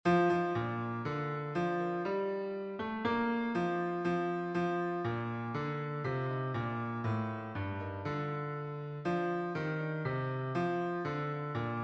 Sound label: bass